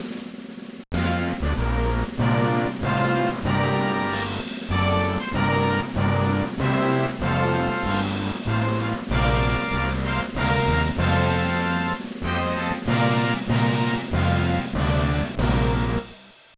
BGM; Baseball Advance; GBA

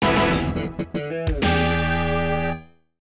Draw Set; Windjammers; arcade

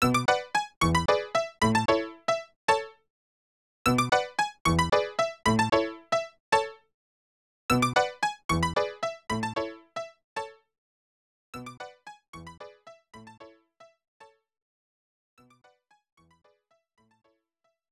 Ringtone; marios mystery meat; snes; Nokia

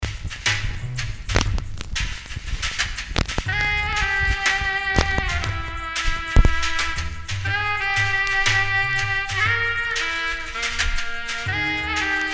Gramophone loop; Agent A: A Puzzle in Disguise; Windows

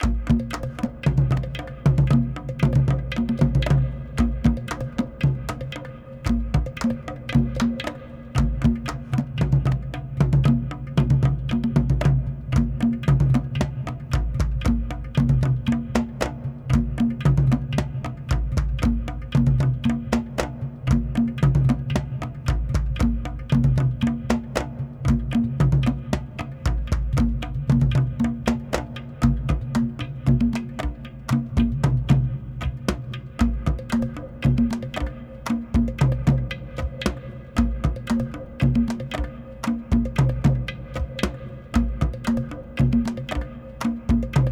Perc; Neptune

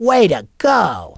Correct

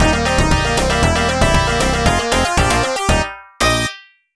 Stage Clear; Super Fantasy Zone; Genesis

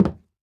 Footsteps Sneaker Wood Walk

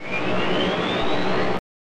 Title Swoosh; Bioworm; SNES

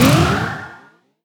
Jump; Pac-Man Championship Edition 2 Plus

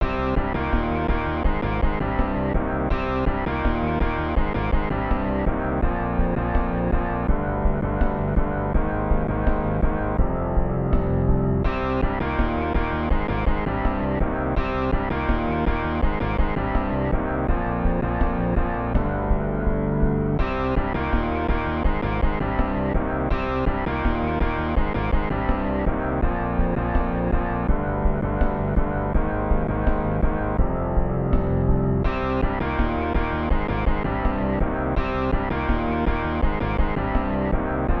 Congratulations!; War 2410; SNES

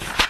paper; misao

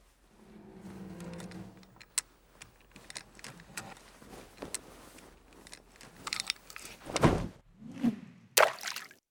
StartFishing; Lucius III

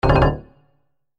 SFX Heavy Interface Alert

